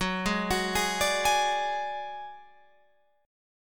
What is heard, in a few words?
Gb Suspended 2nd Sharp 5th Gb, down, Guitar, Open F; arpeggiated